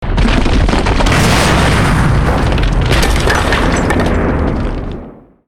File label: Barrier Down; Ruins Rinal